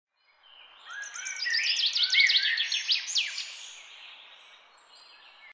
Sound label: Sweet Bird Sound Morning Sound Effect Garden Bird